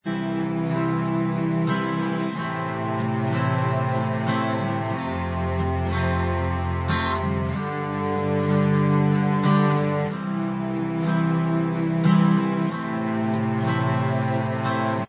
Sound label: Inst Track